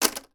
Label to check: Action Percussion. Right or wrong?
Wrong